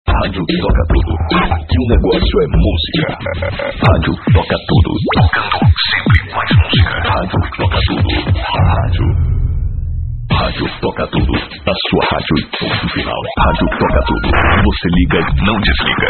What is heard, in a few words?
impactz